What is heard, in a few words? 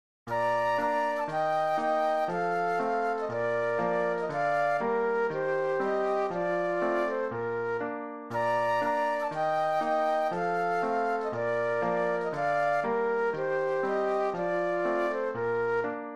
Flute Duet and Piano